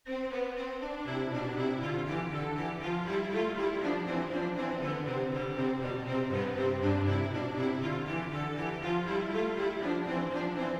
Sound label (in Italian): Passo a canone